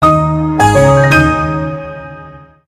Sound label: Samsung Melody